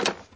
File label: menu confirm; Angry Birds Seasons